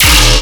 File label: Bass Hit D#, Dubstep; Abyssal Rumble; Ghosthack; Demolition